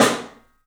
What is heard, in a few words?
PIC SNR L, PICOLO ROOM, 3D Drums; 3D Sound